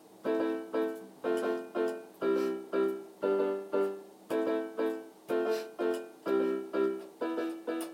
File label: really lonely piano